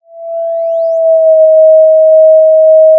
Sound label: Sound of a police car siren